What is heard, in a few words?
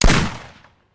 Rifle; Sound Ideas; Guns